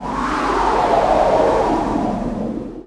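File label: Wind Lance skill; Seer; GamigoNA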